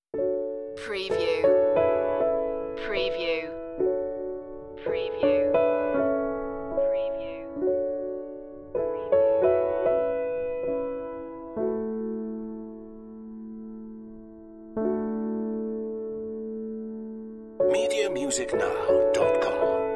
piano only

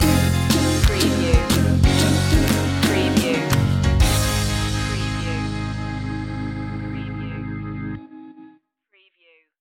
sunny day stinger

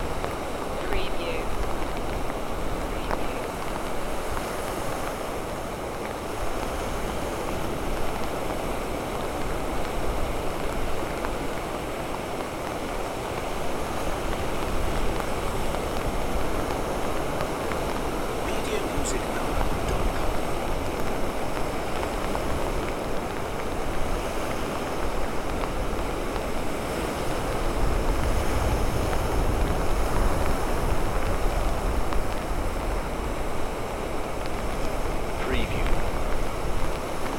Rain on a hood near the sea loop

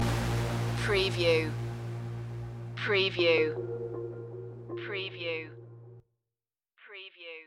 miracle voices stinger

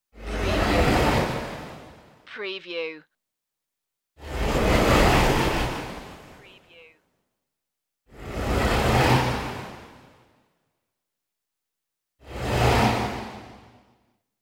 turning disappearance whooshes

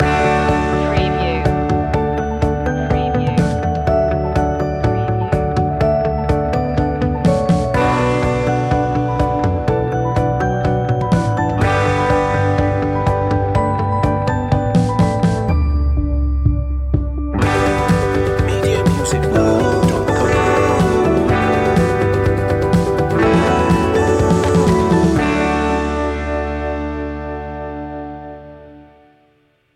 starting something new

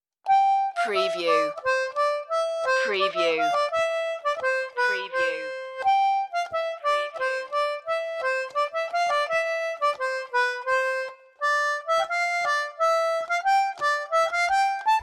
solo concertina; deck the halls

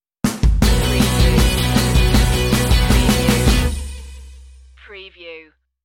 dirty fun stinger

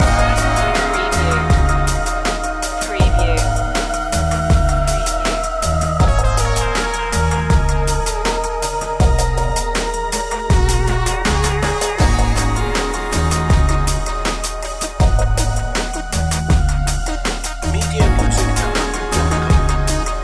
take me over loop

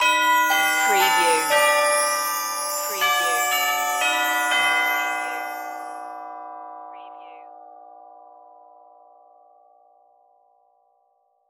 auld lang syne synthified outro bells only

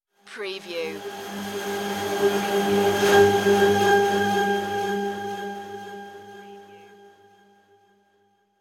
shiny gleam revelation

